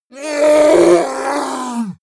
yeti attack shout aggressive painful; schreie

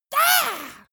monster assault high; schreie

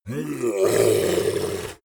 yeti growl angry

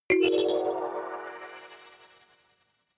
notification kalimba magical second

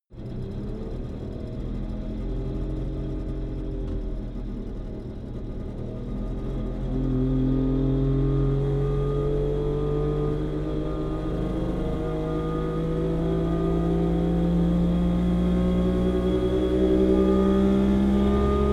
car saab 92 accelerate slow interior

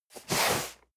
cardboard box medium slide hard; objekte

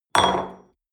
espresso upset table soft, restaurant cafe

